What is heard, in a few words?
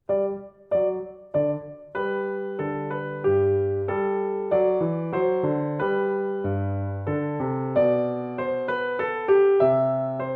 minuet one